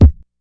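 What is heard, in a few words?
classic hip hop Tough kick; STYLE KITS (Hip Hop, Etc); Classic Hip Hop Mega Kit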